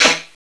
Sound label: LAUNCH, Pinball; Pinball Science